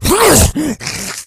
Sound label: rage, infected, male